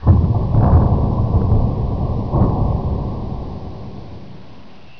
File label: groan; dm achluophobia